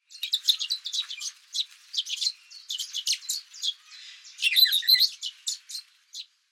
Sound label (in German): Bourkesittich Neopsephotus bourkii; Vogelstimmen